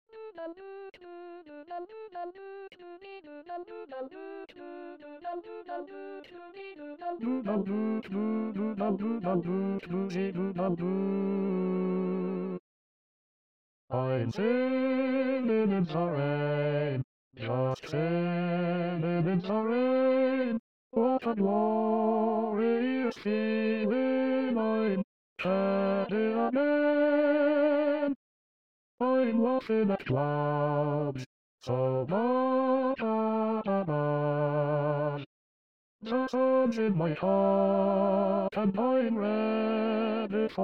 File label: Bass SATB